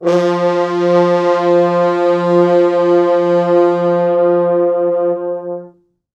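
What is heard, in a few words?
4 French Horns, Horns; F2; East West; Quantum Leap Horns Sax; 4 FrHorns MOD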